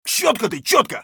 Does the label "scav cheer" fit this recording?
Yes